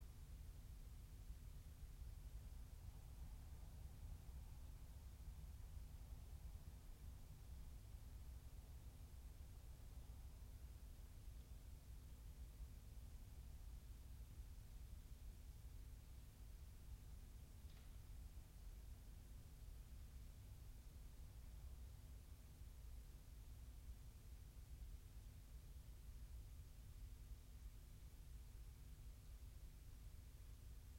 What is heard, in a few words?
yamanakako sound